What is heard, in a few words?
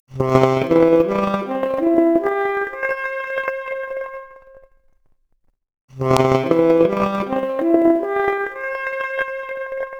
Saxophone arp, mp Stereo output noised, 4bit